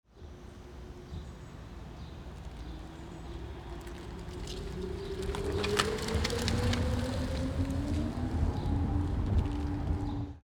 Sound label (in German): fahrgeraeusch e pkw vorwaerts ausparken; auffaelligkeiten e autos bei unfaellen